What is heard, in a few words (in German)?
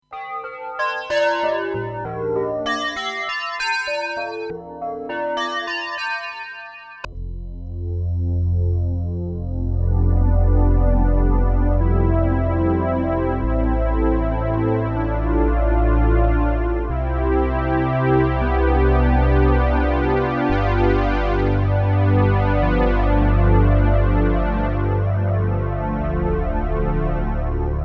glocken und flaeche